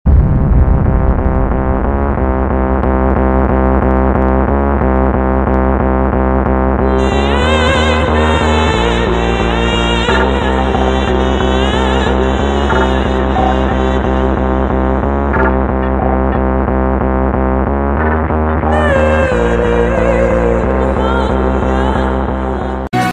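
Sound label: Anger background music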